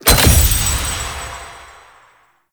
Cet Invasion Offering Pickup; Warframe; Cetus

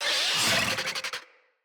Infested Charger Attack

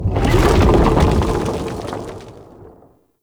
Rock Rumble; Props; Warframe; Tau; Ambience

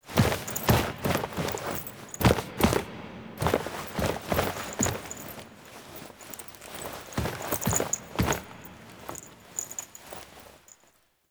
Ceremony End Foley; Narmer Outpost; Chamber Ceremony End; Drifter

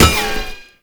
Shield Impact; Enemies; Gameplay; Lasrian Tank; Warframe

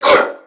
Pain Crewmen; Warframe; CorpusCrewmen; CrewmanB

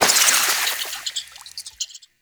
Infested Flower Burst; Warframe; Ambience; NineteenNinetyNine

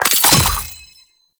Exterminate Key Pickup; Warframe; Nineteeen Ninety Nine

